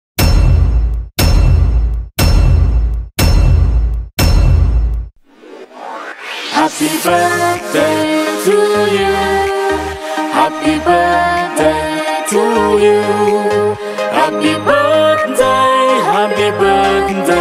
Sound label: Happy Birthday Song